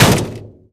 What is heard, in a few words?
Small Impact; Totaled; XBOX